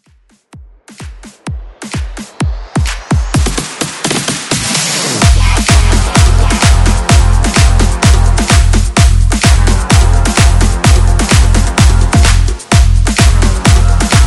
Bass Bootleg

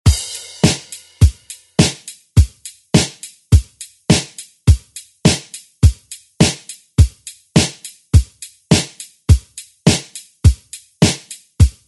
70s Re Drum; DJ Mhark

